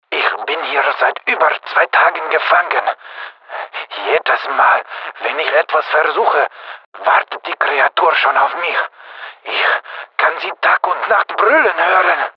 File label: sing